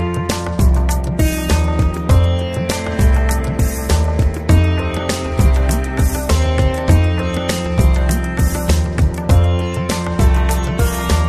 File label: Oppo Reno 10x Zoom Ringtone; India